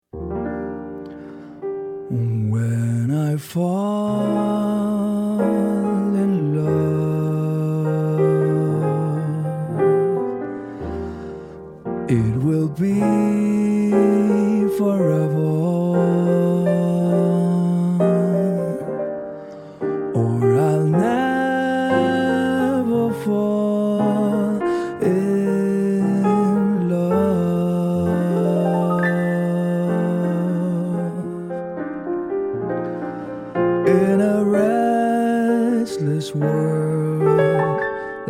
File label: Jazz